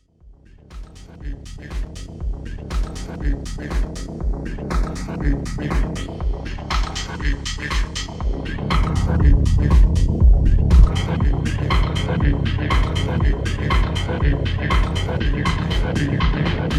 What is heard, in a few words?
Filter Delay; Xone PX5